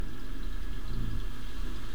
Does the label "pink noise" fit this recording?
No